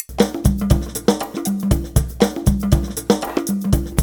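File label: TRIAN, triangle, Modern Percussion Loops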